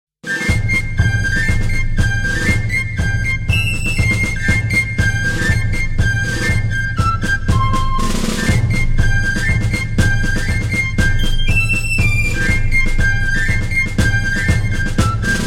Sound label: Marching Music